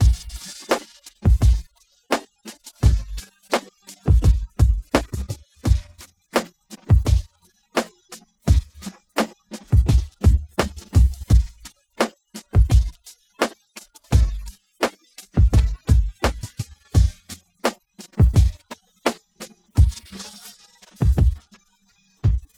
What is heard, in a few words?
automatic drums